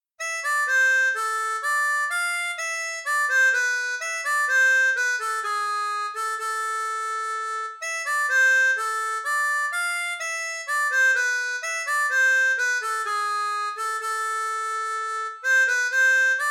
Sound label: Bourree, harmonica; Krieger